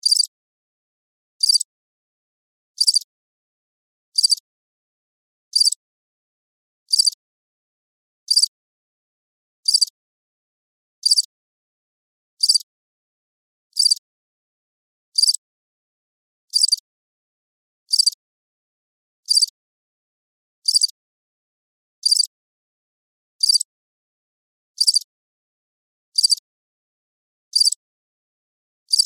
Cricket Chirp, Animal, Cricket, Chirps, Insect, Bug; 5.1; Surround Sound; BlastWave